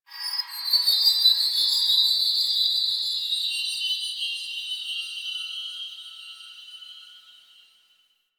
High Texture; Ambience; Warframe; The New War; Quests; Zariman

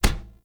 Unarmed Punch Impact Metal

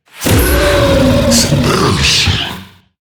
Infested Lich Drillbit Death

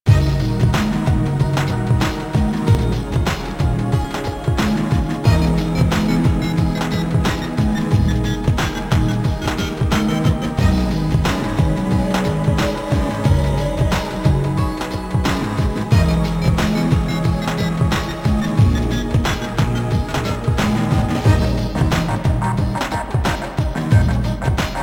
Music Credits; Warframe; minigames; Caliber Chicks minigame

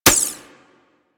Sapient Pistol Mech; Warframe

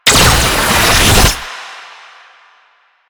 Gunbrella Alt Charge Up, Weapons; Warframe; Tenno; Koumei

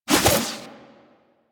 Add Mineral To Meal Throw Whoosh, Alchemy Lab; Warframe; Hub; Liset Alchemy Lab

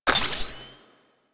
Power On A; Archwing; Warframe; Rail Jack; Archwing Deploy

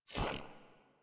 UI Corpus Theme Dialog Close; DE; Warframe

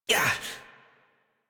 Pain Short, Adult; Warframe; New War; Adult Operator

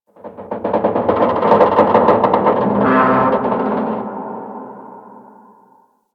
Exterior Metal Debris Creaks; Warframe; Ambience; Rail Jack

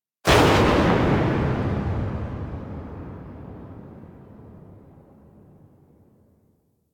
Gunblade Tail, Weapons

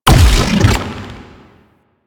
Octopede Lash Limbs Beam Stop; Warframe; Man In The Wall

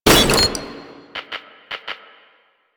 Electronics Misc Destroy; Warframe; Ambience; Nineteen Ninety Nine

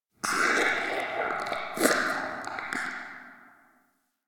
interior goop distant; Ambience; Misc Indoor; DE; Warframe; Infested Eris

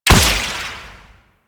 Spike Gun Shot; Enemies; Warframe; Infested; ZealoidPrelate

